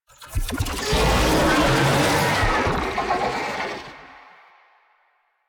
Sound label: Infested Oni Vocal Idle; Warframe